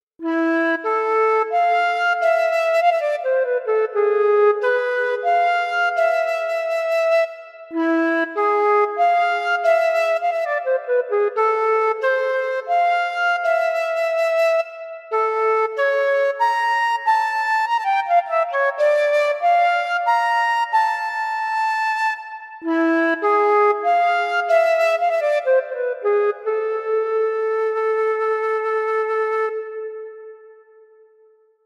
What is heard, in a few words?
Flute; VIVO S10